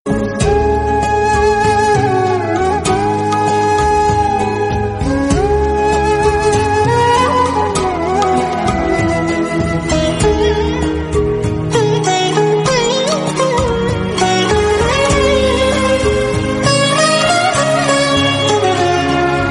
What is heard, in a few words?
music ringtone